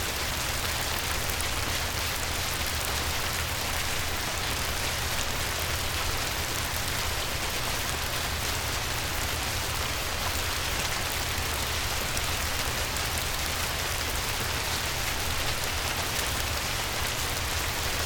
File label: rain